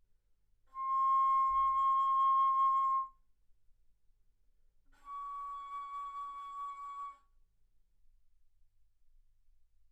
Bass Flute C6 Db6; ff

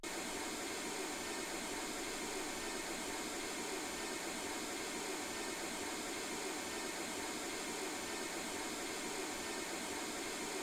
mls16 48kHz

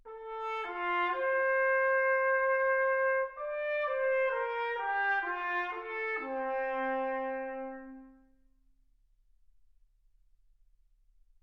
trumpet

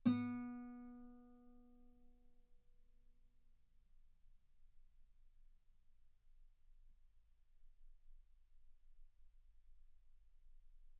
Guitar B3; ff; sul B

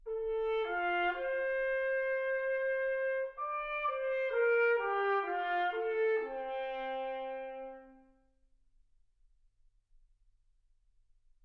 trumpet

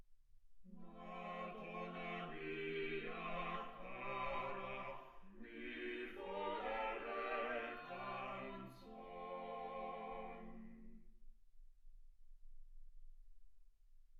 quartet

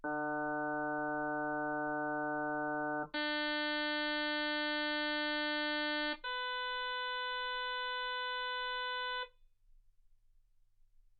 harm sinus 48kHz

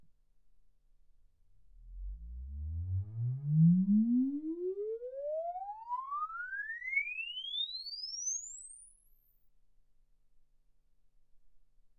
exp swept sinus 10Hz 24kHz

